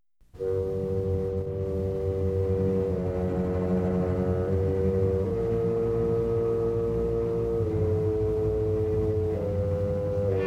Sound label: Sinister Street; 1001 Sound Effects; Halloween Horror Sounds Effects